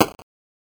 Chop; Five Nights at Freddy's The First Generation; Scavengers Chop1

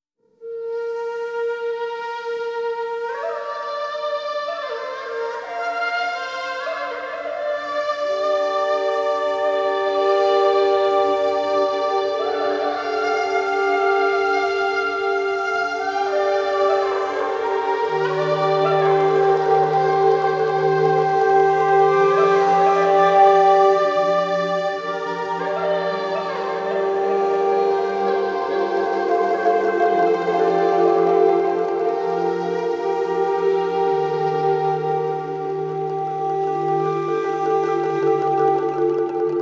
Gamelan; Gineman; Kena Bridge of Spirits

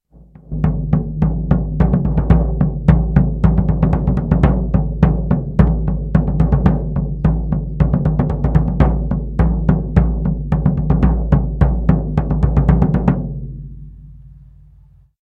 Drum Civil War Retreat; ultimate general gettysburg gamerip 2014

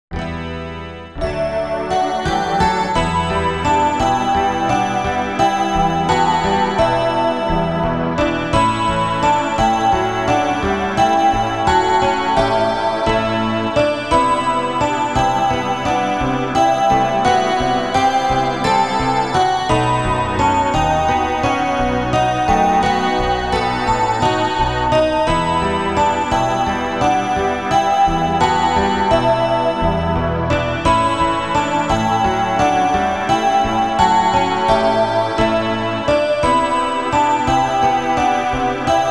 Theme (Sad); Persona 2 Innocent Sin; Eikichi

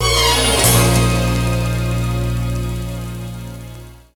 Game Complete; magical tetris challenge; psx

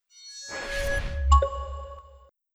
Rare Achievement End; Xbox 360 dashboard

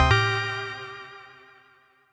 Key Pickup G

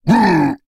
Creatures Fight Damage; mighty party 2017 gamerip pc ios android

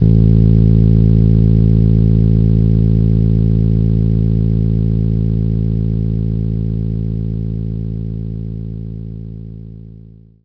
fretless bass A1

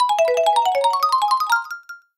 Level Up Item

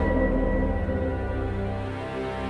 Puzzle Start; City Of Light; prince of persia 2008 gamerip

